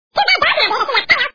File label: pig fail